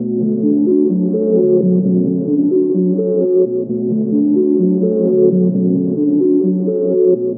Arpeggio Line, Synths; Sonic Foundry (Sony Creative Software); Modular Electronica

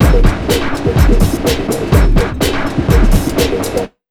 BEA, Big Beat Drumloops

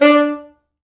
D3, Pop Brass; Best Service ProSamples vol.19; AKAI

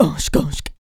HIT, Jazz FX FEM PERC

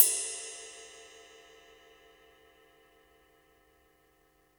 CYM Ride; Best Service Gigapack 2

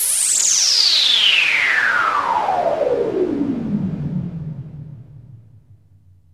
NOISE FALL; Best Service Alpha Dance vol.2

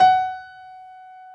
PF F#4, B GRAND PF

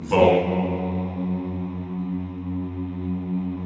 CHO F#1, Vohmm Hohmm; Sound & Vision; Gigapack I CD 2

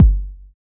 Kick, Kickdrum; Equipped Music; Loungin House

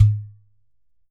GHATAM

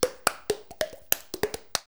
VOCDRUM, MOUTH PERC; Best Service; Hyper Dance

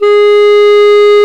Clarinets

